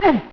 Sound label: ugh; Midway Games; Smash TV